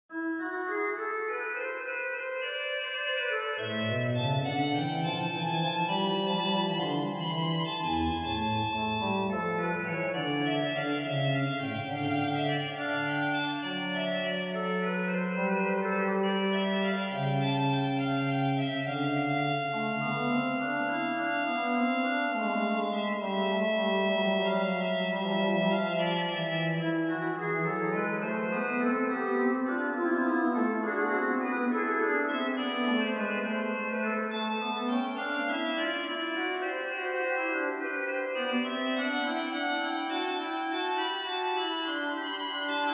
Messe Paroisses Gloria Duo sur les tierces, OrganMusic; Glorificamus Te; Couperin Francois; Souvigny